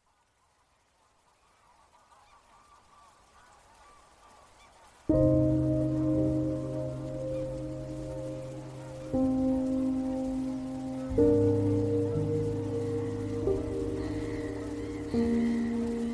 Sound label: Relaxing Rain